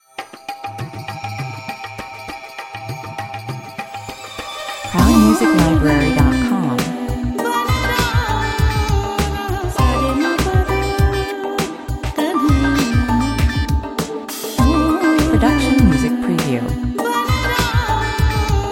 electro india 100 bpm

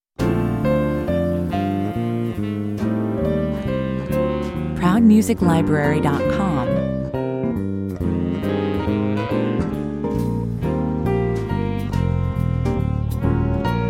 walz 138 bpm

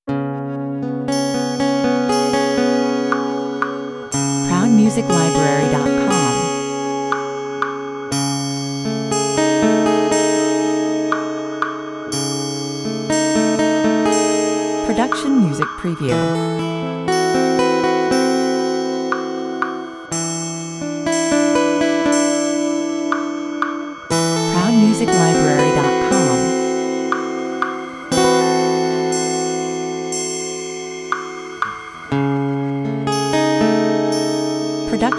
guitar soundscape

